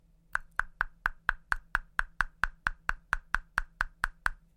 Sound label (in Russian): zvuk udara karandasha o verhushku svechi